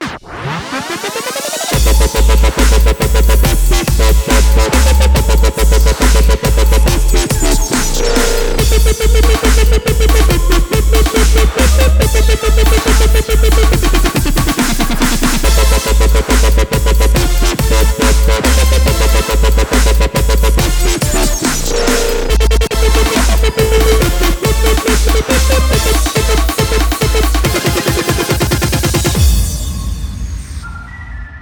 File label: dubstep intro